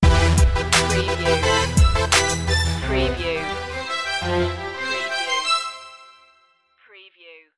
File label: hip parade sting